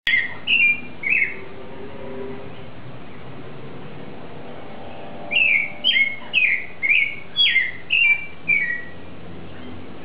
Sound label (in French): Chant du yiguirro